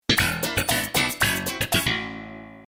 sitcom transition bass riff; seinfeld

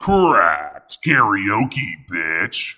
karaoke

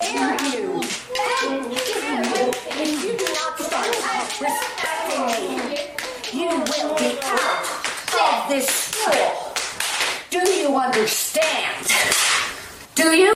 paddled hairbrush; sp nked guys ensemble

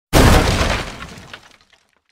machine breaking

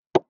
dart hit board wood

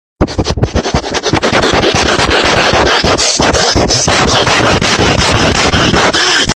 cat sniffs the camera

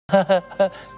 haha ha

